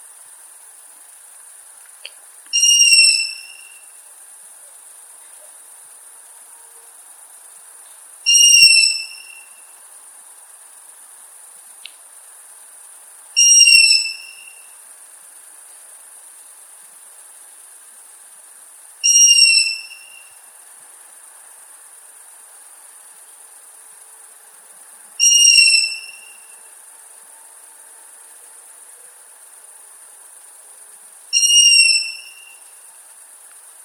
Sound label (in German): junge Waldohreule